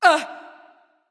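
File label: surprise; smite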